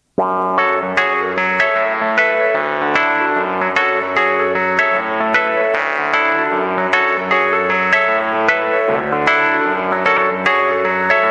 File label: NORD WURLY